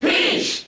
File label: Cheer English; Peach; SSBB